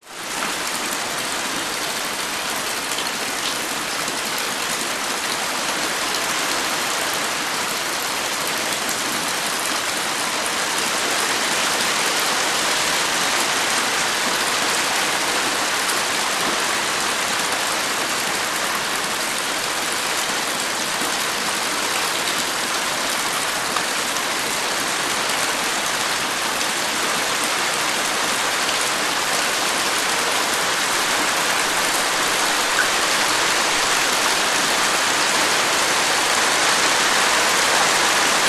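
nature rain medium getting heavier heavy drips into deep water close by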